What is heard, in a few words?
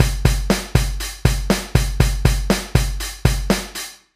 drum